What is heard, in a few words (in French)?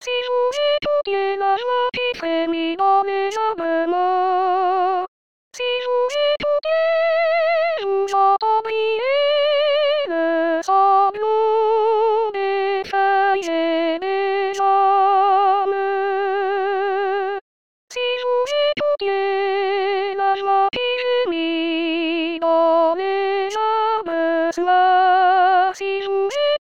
sopran; rimailleurs